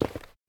Footsteps, Human, Tile, Run; backrooms 2d android ios online windows gamerip

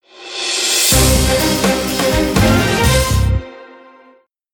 Race Victory

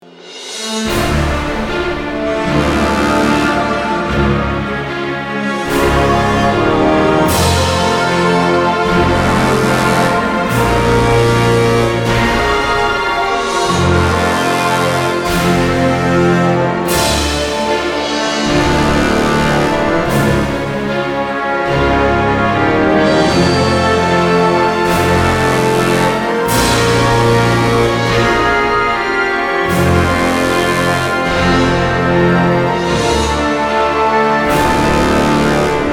Battle Dm 150bpm; Disney Infinity 2.0 Marvel Super Heroes; Arachna

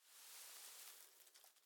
Hay Movement; The Town

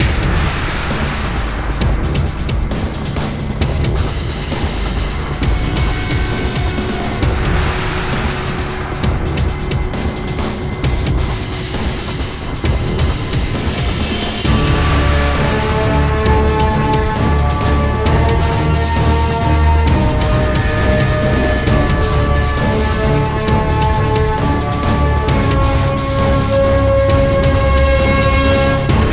Environment Music

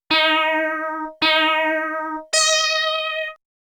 Race Start; Sega System24 Sound Collection

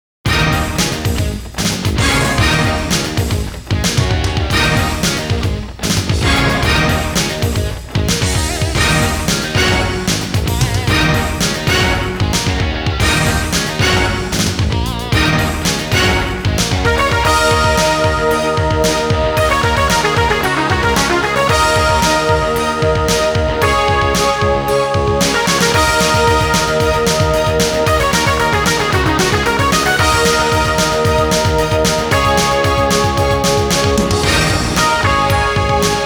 Guitar Dance; savage reign anthology arrange sound trax gamerip; Staff Roll

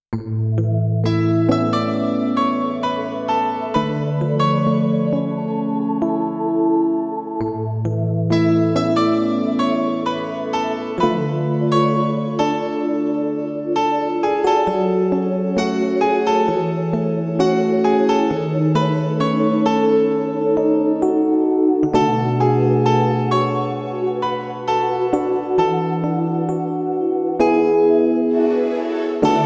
(Instrumental)

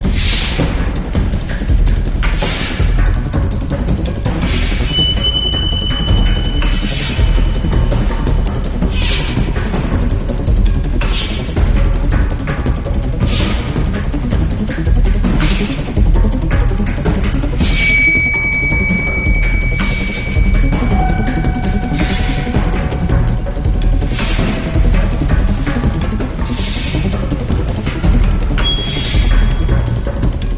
Action Loop

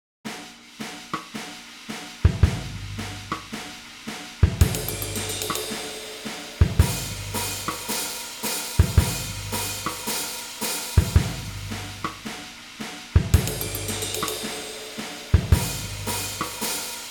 Drum Practice; the legend of zelda majoras mask reborn 2023

